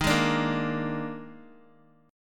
Eb Major 7th Suspended 4th Eb, Bass Standard; strummed; EbM7sus4

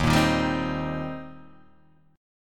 D sharp 6th, D sharp, down, Guitar, Open D

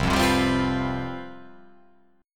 C Major 7th Suspended 4th, down

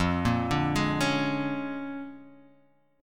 A sharp 7th Sharp 9th

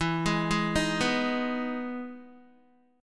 E Major 7th E down Guitar; EM7 arpeggiated

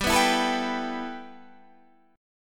G 6th Add 9th G Guitar DADGAD, chord

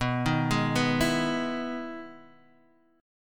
E Major 7th B, chord

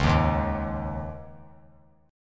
Db Suspended 2nd 3C,3F,3A,3D; strummed; Dbsus2; JGuitar

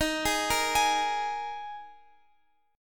D sharp Suspended 4th, Guitar, Open F; arpeggiated; tuning Open F

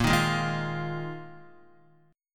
Bb 7th Suspended 4th Bb Guitar 4F,4A,5C,5G,5C,6E; strummed; JGuitar

